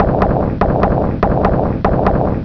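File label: IMPENDING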